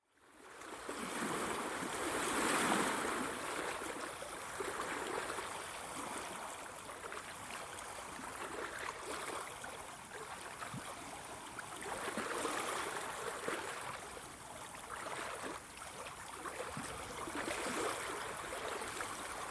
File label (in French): lac; La-Fabrik